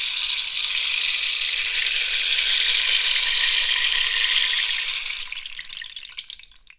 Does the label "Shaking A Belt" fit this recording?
No